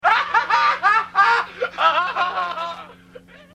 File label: John laughing